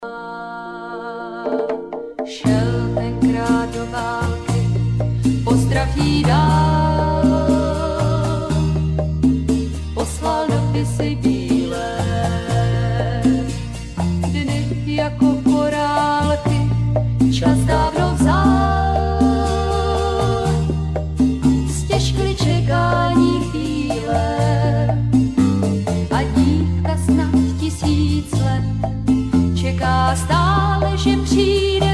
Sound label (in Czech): tamtamy